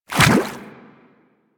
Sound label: Infested Small Walk Water, Footsteps; Warframe